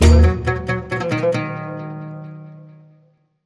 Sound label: Good Oud, Hit